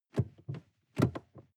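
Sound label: sports car interior seat handling short; verkehr transport; autos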